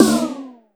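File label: snare processed, Disco House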